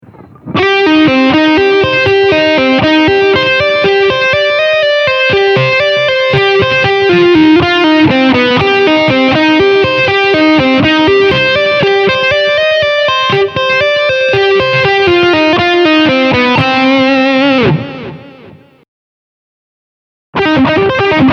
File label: string skipping Do min, guitar